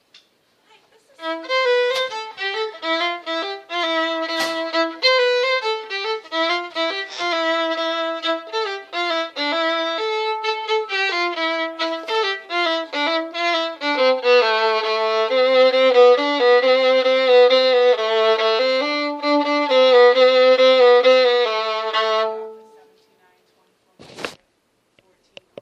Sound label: gavotte breton, fiddlers